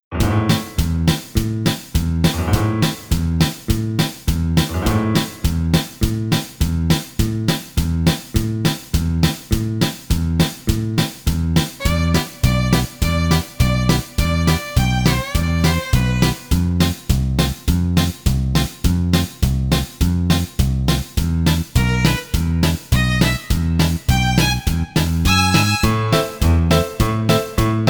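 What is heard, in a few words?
backing track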